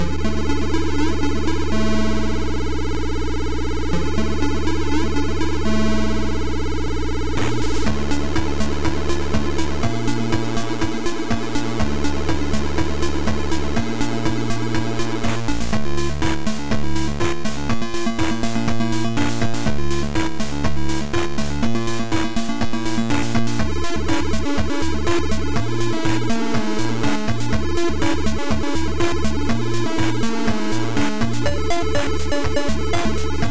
Groove

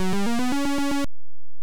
Title Beeper; Nebulus

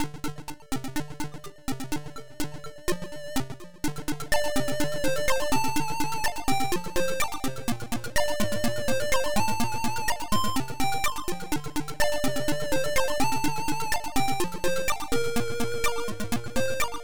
menu; Coinz are mine

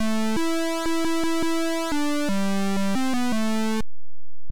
Title, Beeper; Fire Lord

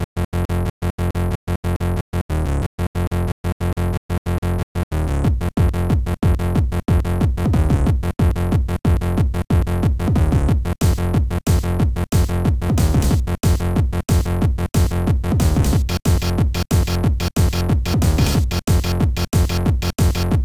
RAVE

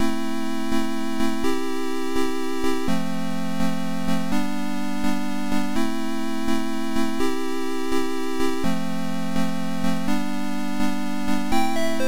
game music; Infosoft; Cyber mouse

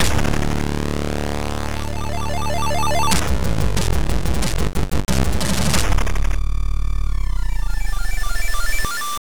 Game Over; Captain Drexx

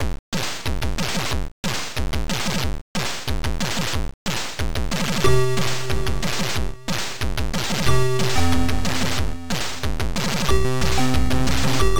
Techno; Techno Mania 7

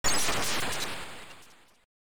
Title AY; Hawk Storm

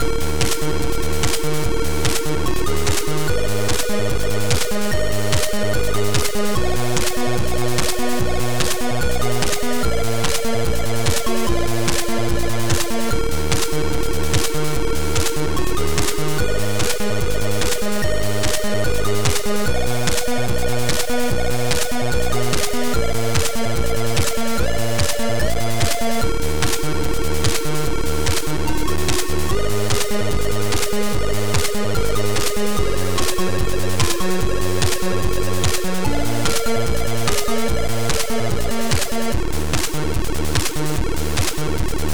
POP

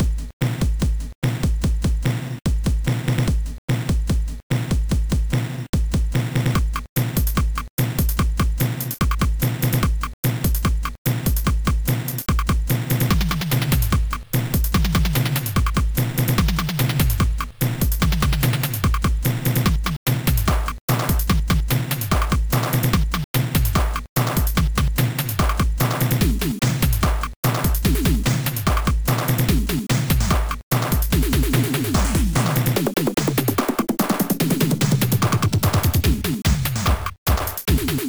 DISCO

